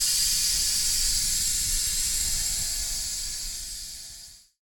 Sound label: steam release